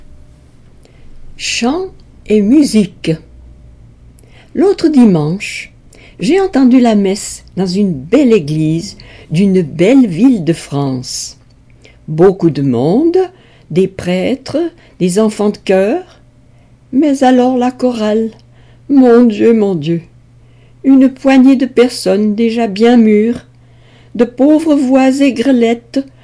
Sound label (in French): chant et musique, Le Patois; La fondation HorizonSud